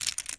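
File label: diamonds; diamanty; Mafie 1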